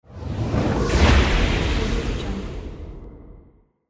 wizard death lightning bolt

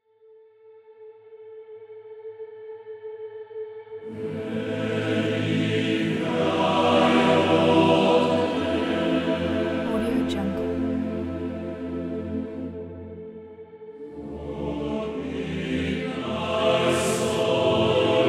sad angelic choir

